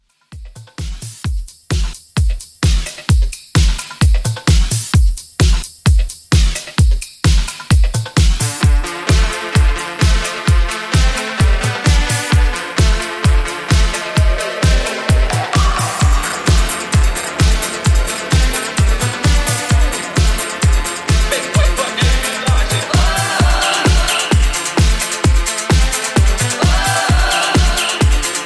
Classic Dance Redrum 130Bpm Clean

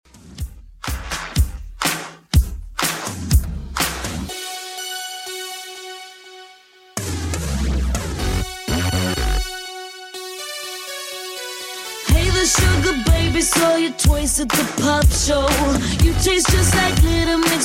123 bpm

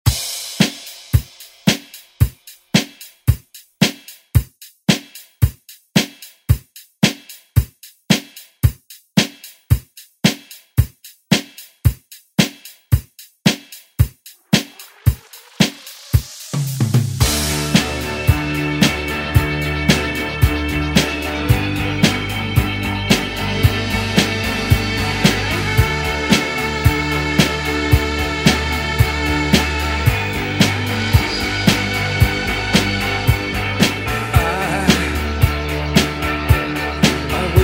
70s Re Drum; DJ Mhark

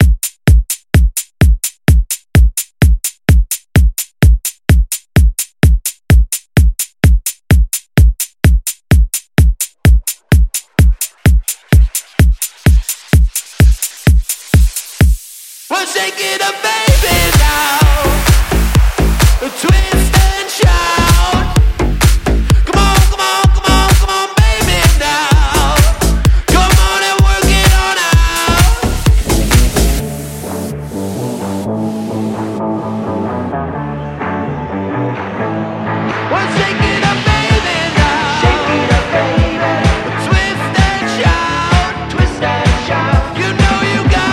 Club Mix

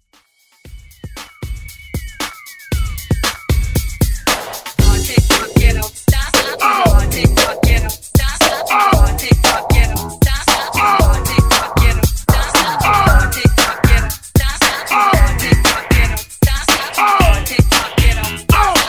80s hype redrum

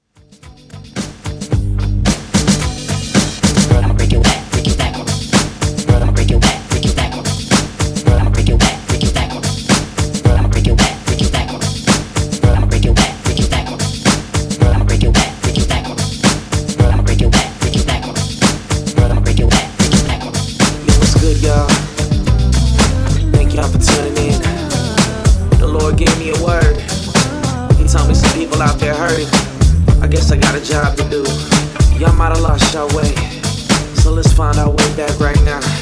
Extended Mix 110Bpm

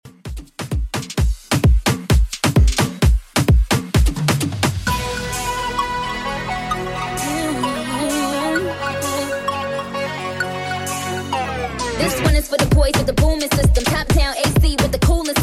2ks Afrodance 130bpm

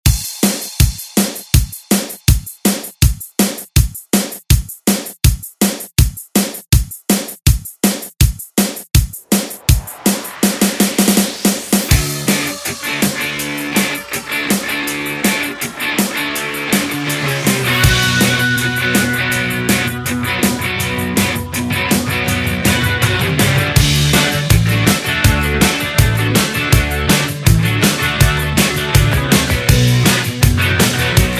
ReDrum; DJ Mhark

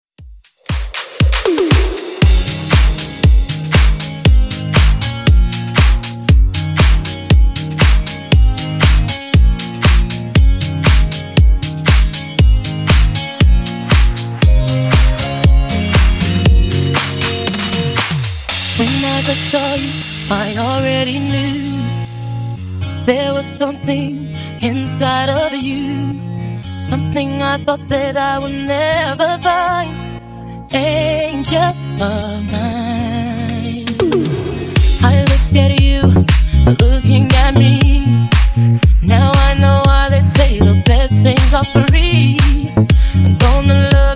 Disko Funk Edit 118bpm

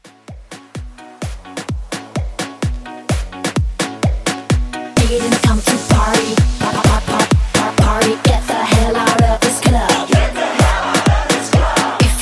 Afro House Hype

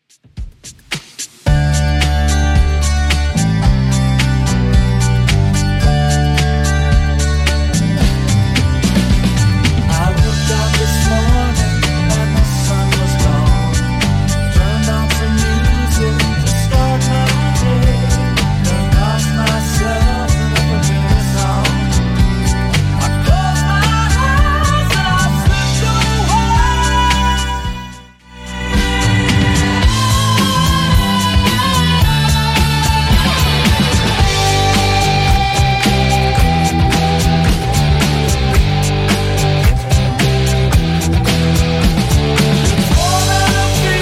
80s Redrum 110bpm

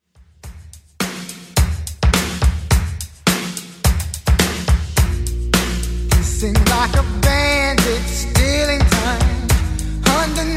80s Redrum 106bpm

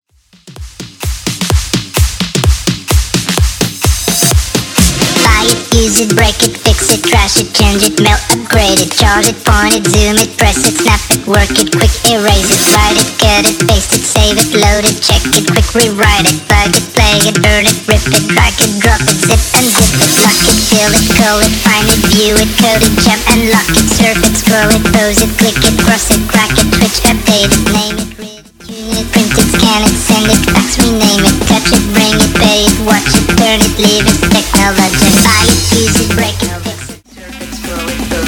Electro House 124bpm

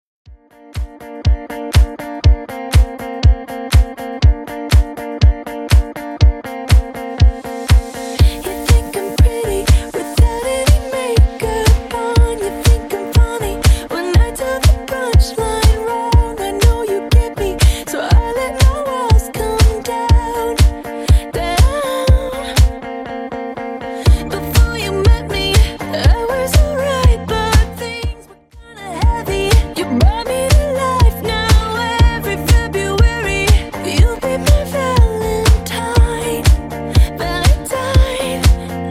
Top40 DJ Friendly Redrum 121bpm

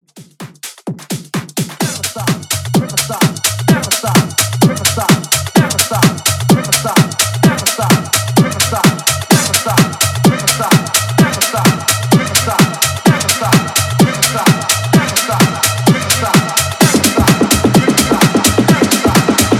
Tech House Club Edit 128 BPM; remixplanet

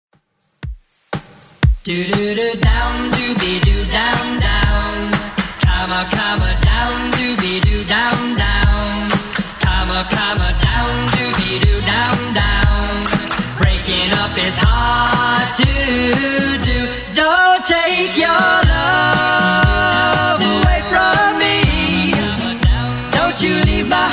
60s Dance Redrum 120bpm

